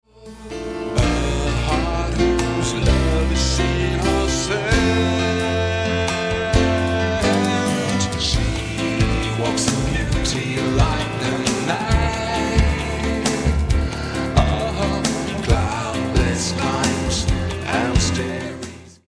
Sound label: bassline